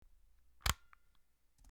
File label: walkman button; EvilCocoGame1